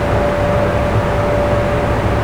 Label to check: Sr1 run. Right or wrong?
Right